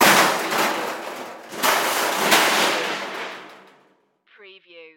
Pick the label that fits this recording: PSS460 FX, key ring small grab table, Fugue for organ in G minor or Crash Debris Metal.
Crash Debris Metal